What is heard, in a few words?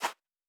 Footstep Sand Running